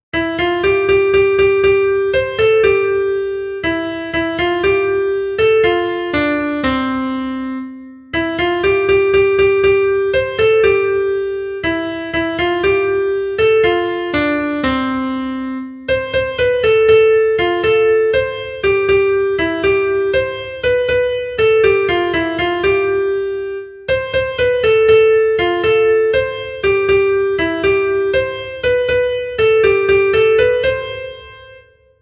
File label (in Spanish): soprano, himnos en piano; 40 DEMOS GRACIAS AL SENOR; cantos del camino